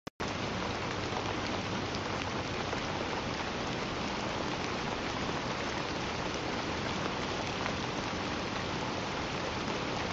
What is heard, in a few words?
rain